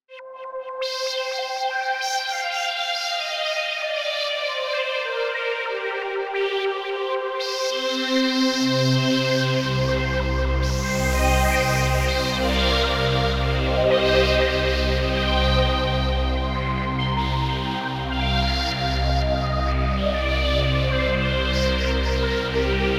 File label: s and h pad